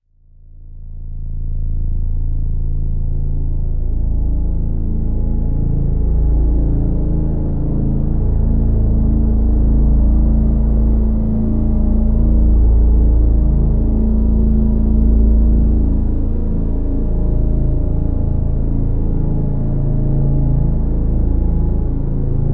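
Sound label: drone; Interrogation A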